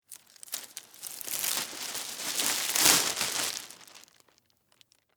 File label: Household, Garbage, Bag, Untie